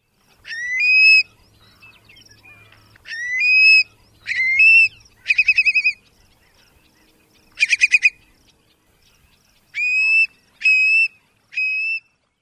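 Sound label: Long-billed Curlew curlee and other calls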